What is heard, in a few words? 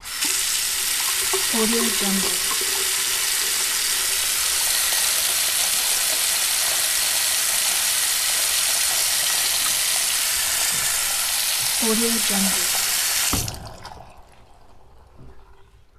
water flows from the faucet; ndeemax